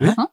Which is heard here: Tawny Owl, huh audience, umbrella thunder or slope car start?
huh audience